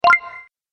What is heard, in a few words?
Positive Win Mini Bonus, Video Game Sound Effects